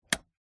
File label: household radiator heater portable switch click